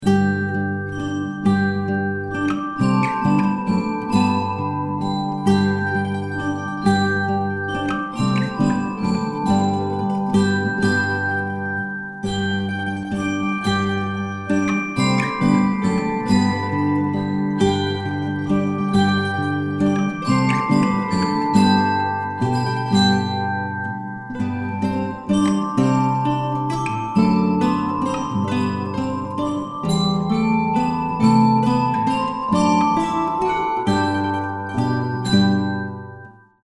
Pastorale, campanari

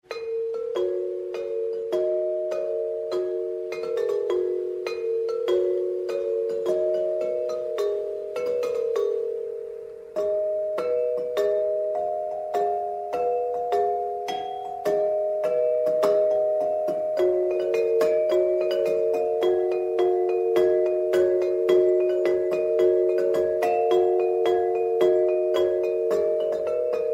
Audio campanine

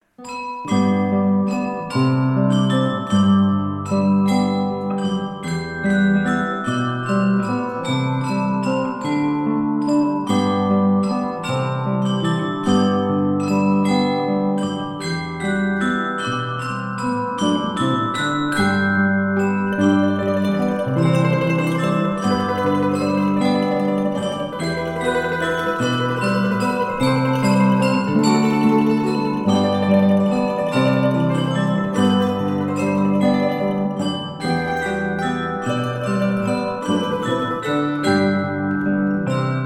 Pastorale, campanari

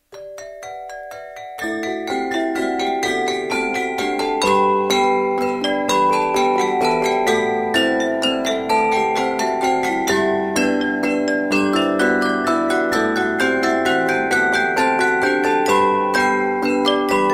Mazurca Vapore, campanari

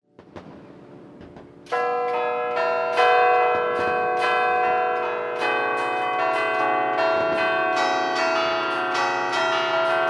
Suonata serale, campanari

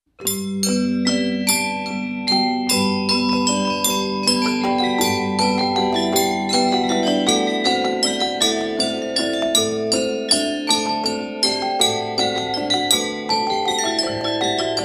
armonia, campanari

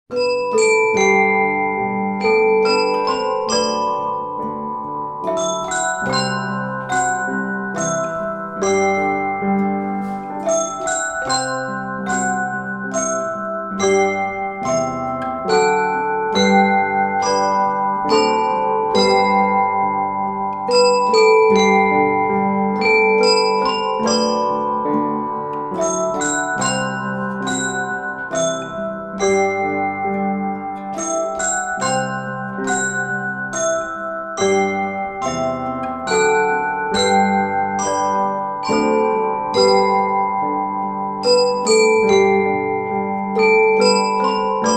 Noel, campanari

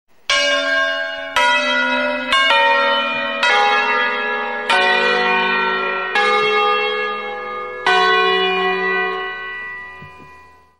Scala, campanari

